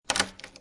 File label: Door Locked